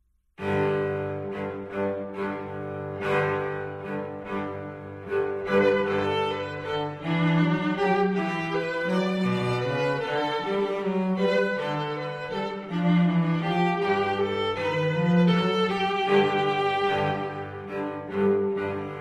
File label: menuetto